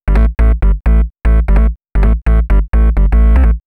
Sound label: Bass, uk garage, 128bpm